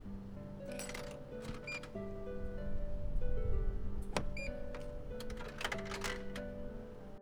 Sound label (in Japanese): 自動販売機（音の鳴る） 返却レバーとコイン返却